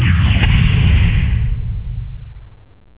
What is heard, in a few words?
fire charge, sentry gun; CSSB